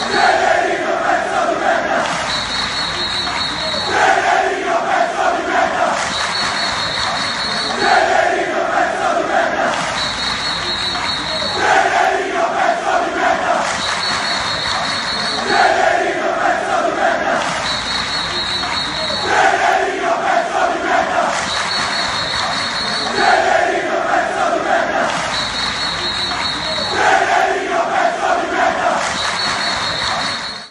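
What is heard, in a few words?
best pisa chants, football chants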